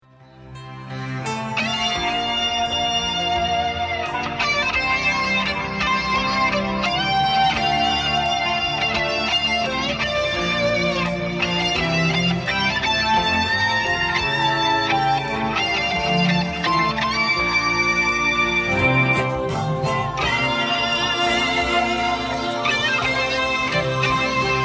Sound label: Solo